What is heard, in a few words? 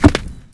grapple Attach Static; Kerbal Space Program; KAS